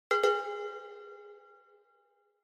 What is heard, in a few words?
Cowbell (Reverb)